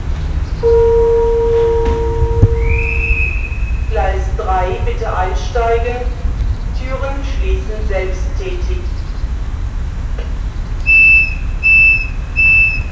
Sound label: ice door